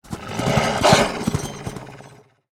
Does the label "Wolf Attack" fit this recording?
Yes